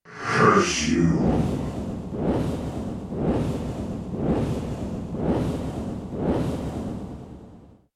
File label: Arcane Curse; Silencer